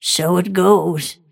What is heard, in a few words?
last hit pain; snapfire